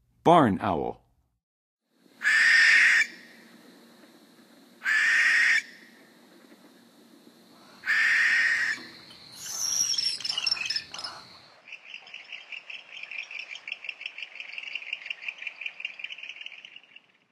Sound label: Barn Owl, Birds of North America; Western USA; Western USA Songbirds